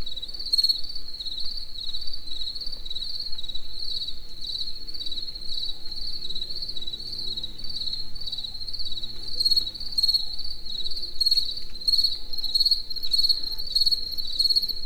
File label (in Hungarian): estefelé, tücsök; allathangok; termeszetben; egerturistahaz